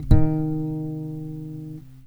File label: DRY BASS, Electric Basses; PlatinumPhattCD2; E-MU Producer Series Vol. 8 – Platinum Phatt